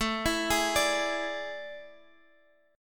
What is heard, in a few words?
A Major 7th Suspended 4th, Guitar, Open D; chord